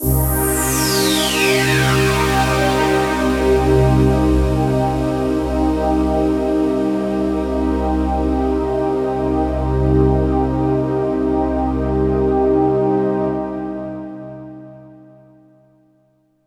JD-800; M8

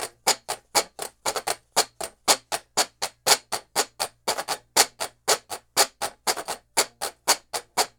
Washboard, Appalachian; Sonic Foundry (Sony Creative Software); Continental Drift Aftershocks

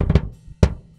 Doublekick Loop; Airwindows

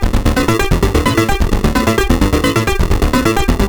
Juno-60 Arp, 130BPM; musicradar; bitcrushed; Crushed Loops

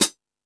Metal Drums, E-MU Pro-Cussion; E-MU Pro-Cussion-1

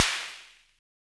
Snare, Univox SR-95

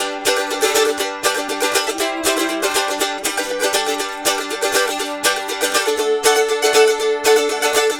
Mandolin Rhythm; Continental Drift Aftershocks

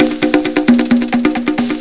bongo eko; Urban Shakedown

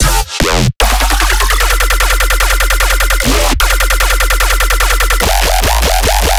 bass loop acid time 150 F; Virtual Riot

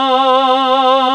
VOI FEMA, vocoders; Sound & Vision - Gigapack I CD 2

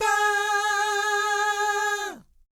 VIB FX, Vocal Planet Jazz & FX; ILIO; JAZZCOMBO

